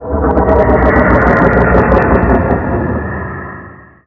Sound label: rumble; jhen mohran